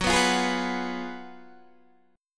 F sharp Major 7th Suspended 2nd Suspended 4th, Guitar, Open D